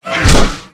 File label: attack; CoreMagriffi